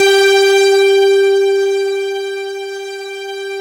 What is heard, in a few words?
CELLU G3, Yamaha VP-1; Music Store; 90's Sample CDs; CELLULOID